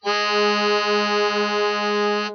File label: xfade, timbral interpolation